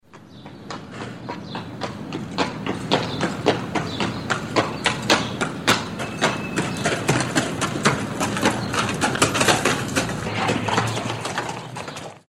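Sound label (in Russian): zvuk kopyt loshadi, horse hooves sound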